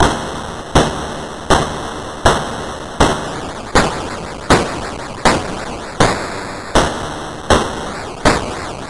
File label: bangy